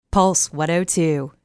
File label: pulse